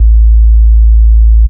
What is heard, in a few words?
sub A, techno trance bass; Techno Trance Essentials